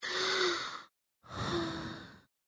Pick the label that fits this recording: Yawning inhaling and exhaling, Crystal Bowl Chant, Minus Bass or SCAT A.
Yawning inhaling and exhaling